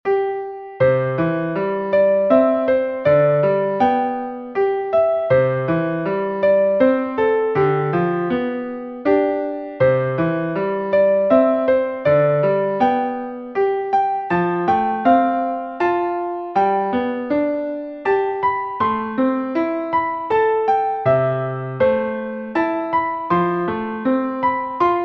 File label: Gentle Grace (for Harp in C)